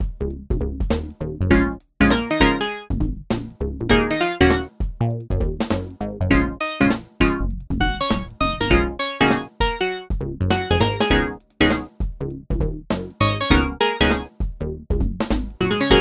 reso bass; sirius

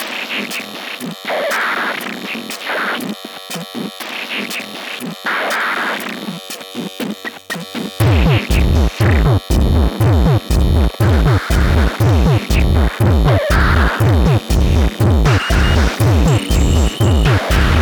mixer feedback; model Y EM-90A

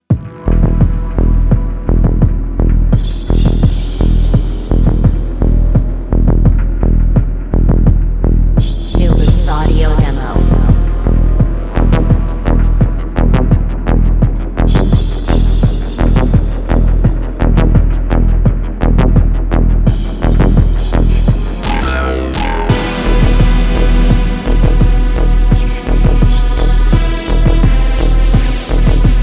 pluck bass soundtrack

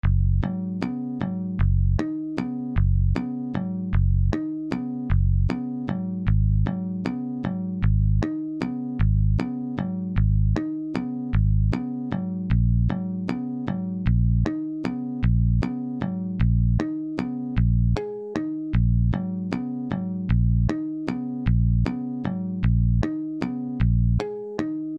muted bass

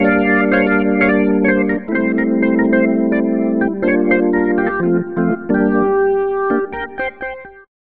vibration waves organ dry

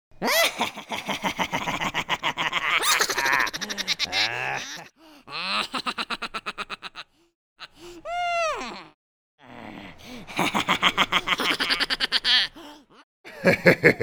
laugh multiple split personali

